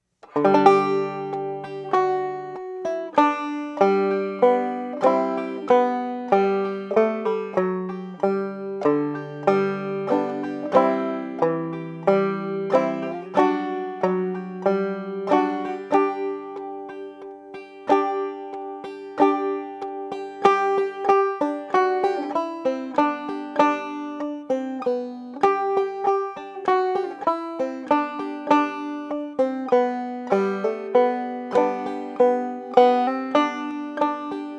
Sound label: joy slow, banjo; Christmas